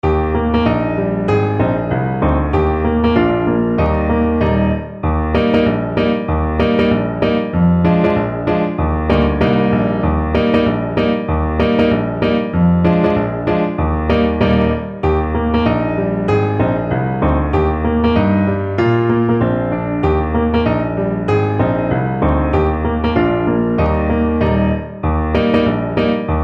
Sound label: tell my ma, Trombone